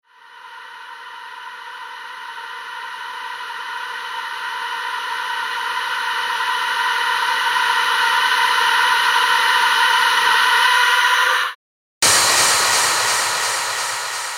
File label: EDM SFX